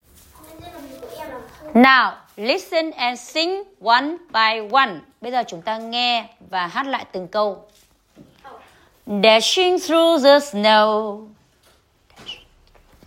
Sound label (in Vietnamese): Jingle Bells nghe hát lại từng câu; HS trường TH Mỹ Đức tham gia trải nghiệm xanh